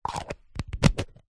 household shaving foam can plastic lid put on

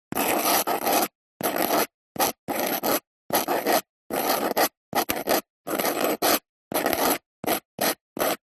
Handwriting Dip Pen Medium

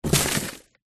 toast tip into trash

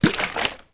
household water spray bottle shake water inside

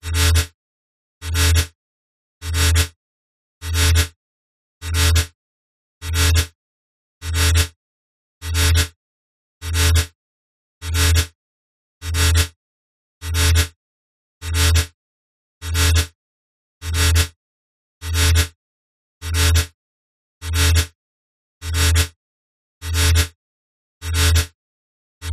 emergency alarm warning buzz slow

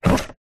office plastic paper drawer tray slide open

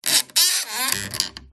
Stress Creak Squeak Groan; PMSFX

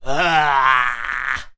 Human Evil; little robot sound factory

